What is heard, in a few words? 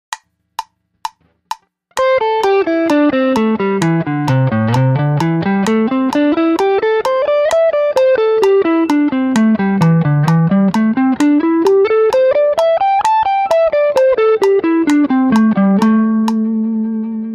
Penta 130bpm